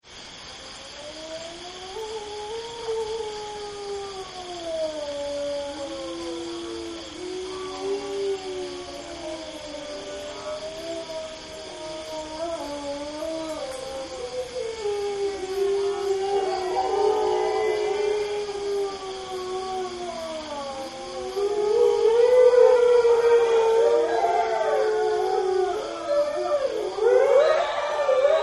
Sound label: Jungle Night Howling; Nature Scenes; Forests and Jungles; Partners In Rhyme